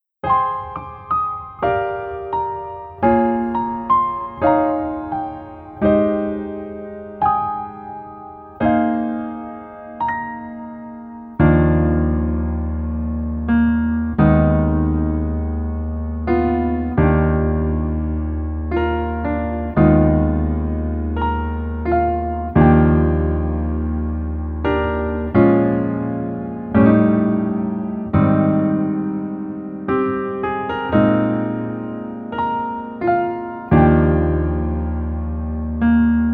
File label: Piano ver